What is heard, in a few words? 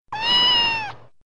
Crazy Cat